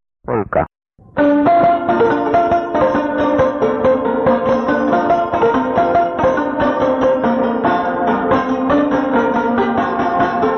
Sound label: Polka